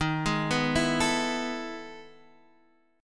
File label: E Major 11th D sharp